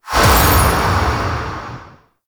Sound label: Void Tower Capture Disrupted